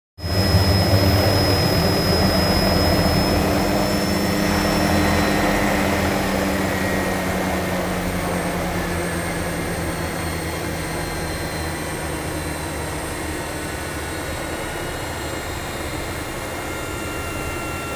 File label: Helicopter lands, turns off inside